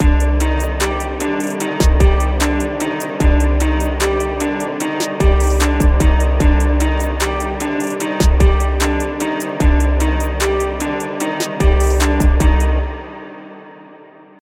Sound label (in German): Hip Hop ungemastert